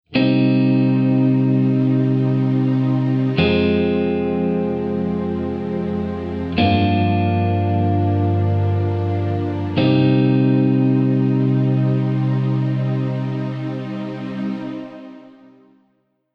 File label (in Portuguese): Progressao Cm Fm Gm7 Cm